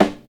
fresh clear acoustic snare D key; snare drum samples

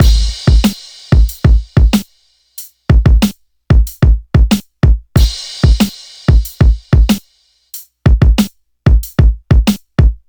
93 bpm drum loop sample g sharp key

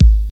puffy kick sample g sharp key

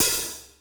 soft open hat one shot d key; Duration 0:01; Samplerate 44100 Hz

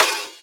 airy trap snare drum b key

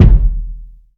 low bass drum single hit d sharp key; kick drum

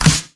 Dry Snare Drum D Key; snare drum samples

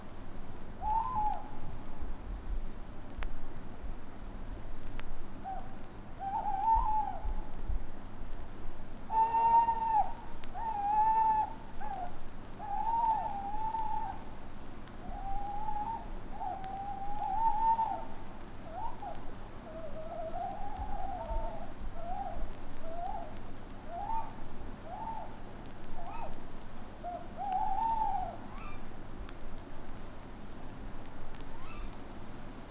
graveyard atmosphere, crows horror, vinyl

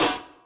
old school crispy steel snare drum sample b key; Duration 0:01; Samplerate 44100 Hz

